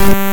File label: Various Buzz Killer